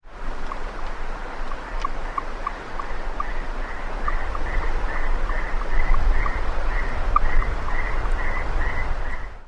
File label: b canorus distress